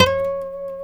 D 5 HAMRNYL, HAMMER NYLON, Guitars

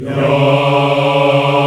VOX African Yas; African Aahs; Roland LCDP11 Africa VOL-1

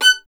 STR VLN, Solo Instruments; Miroslav Vitous

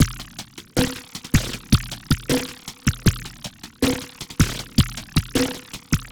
PARTICLE BEAT

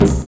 PERC THEA; Univers Sons - Kitch 70s

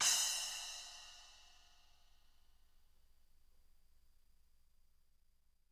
splash, splashes

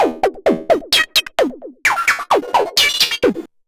Android Arps 130BPM

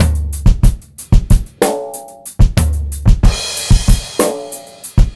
beat, Roots Funk; USB Soundscan vol.41; AKAI

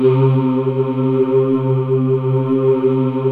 AAHH, Korg M-1; Rubber Chicken Software Sample Bank

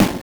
Snare, Genesis; Sparkster; M8

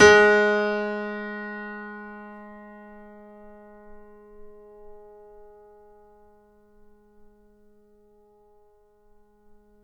Grand Piano FF G#2; AKAI S6000 CD-ROM - Volume 2; Grand Piano 256M

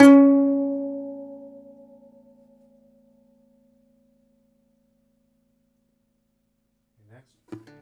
DOMRA, Plucked Strings, Segundo Domra

